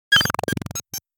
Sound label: Hologram interface tiny glitch